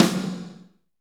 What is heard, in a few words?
SNR, Snares, Roland; Northstar; Drumscapes Roland; Power Ballad